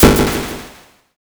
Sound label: thunder magic